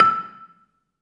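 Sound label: Balafon 2 E5, Asian Traditions; Soundscan vol.60; AKAI; BELAFON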